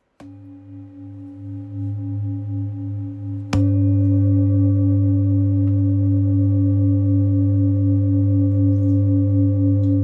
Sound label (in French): Bol ramgandi sol diese